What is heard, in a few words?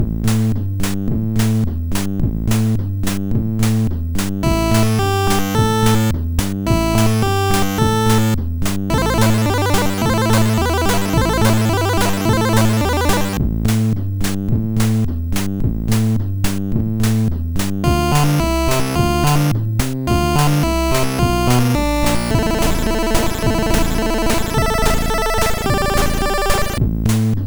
MOS 6581